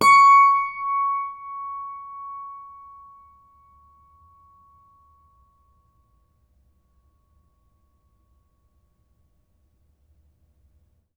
String Pluck, Piano; Piano Miscellaneous

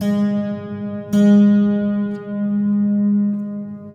Detune, Piano; Piano Destruction

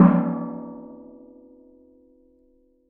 Strike, Washing Machine Drum Outside

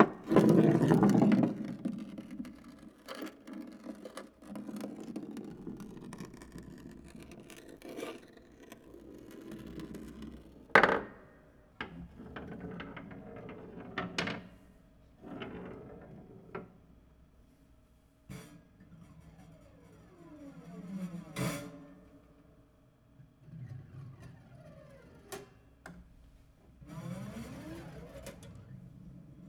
Marble Sparse Roll, Piano Destruction

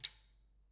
Back Pedal, Bicycle

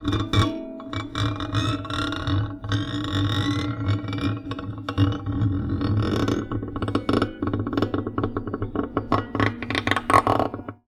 Classical Guitar Extended Technique

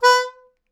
Melodica Short; Short Notes Chromatic

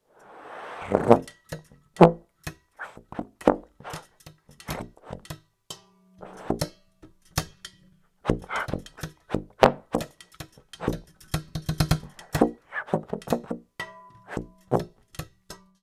Mixture, Trombone Extended Technique

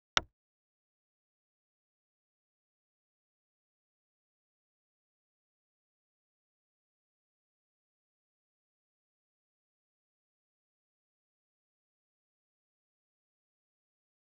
Sailing Pings Only

